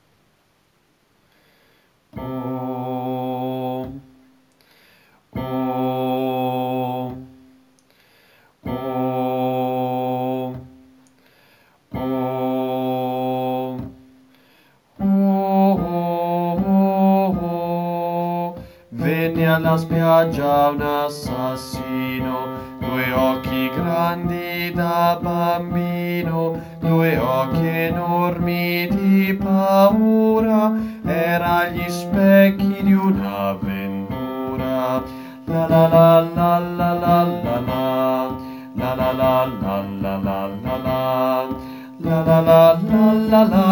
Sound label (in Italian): bassi; Coro Isola del Giglio